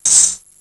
SWING; FISHWISH

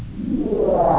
ZOOM; HIGH TECH

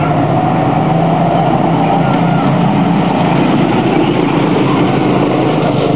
go carts

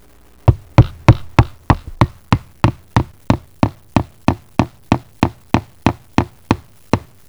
HAMMER

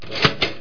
toaster; JOHNNY

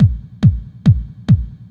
DRUM, HOUS-TEC DRUMS; MAGIX

